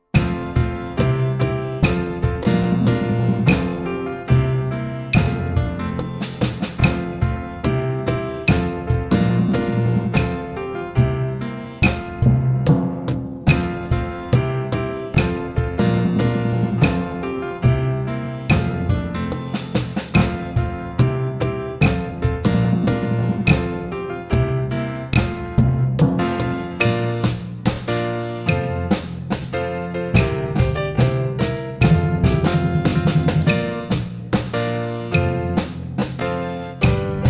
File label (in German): Ambergerhymne playback ohne Vorspiel langsam